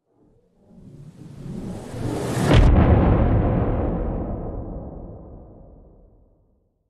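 sound design cinematic whoosh into impact missile like explosion; zapsplat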